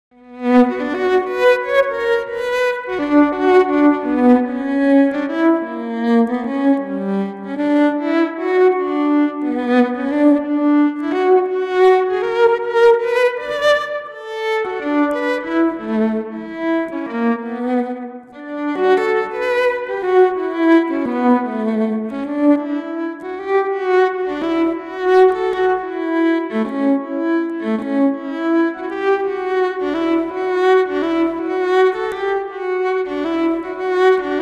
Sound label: Solo